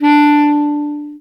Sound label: Bass Clarinet D3; DirectWave; Varazdin Orchestral